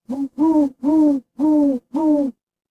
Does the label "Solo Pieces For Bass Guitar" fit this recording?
No